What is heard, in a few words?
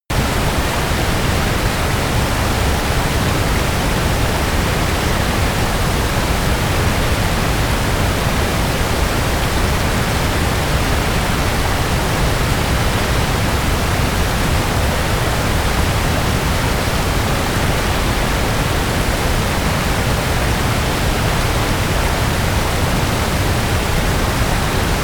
generic waterfall loop large